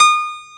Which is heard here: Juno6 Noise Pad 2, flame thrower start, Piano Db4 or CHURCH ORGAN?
Piano Db4